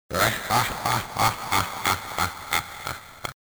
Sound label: Laugh; Splatterhouse 2; Genesis